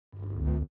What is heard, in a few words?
lightsword swing swipes; soundscrate